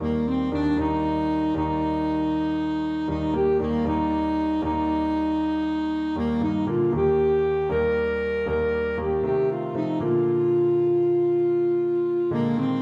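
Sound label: alto saxophone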